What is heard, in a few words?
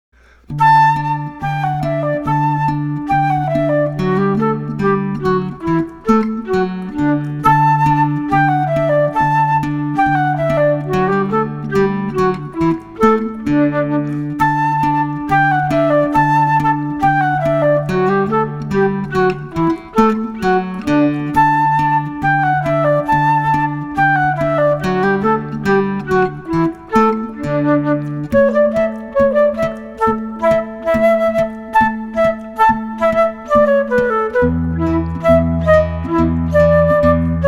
Musette w flute